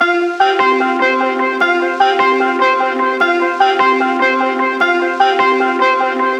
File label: synth loop baltimore 150 C; Virtual Riot